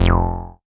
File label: bass F1, Moog Minimoog